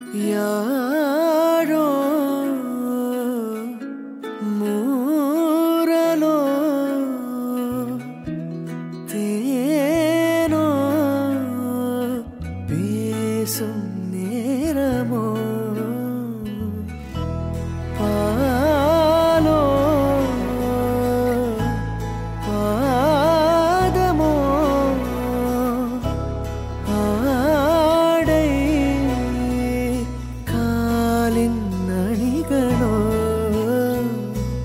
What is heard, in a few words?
Ringtone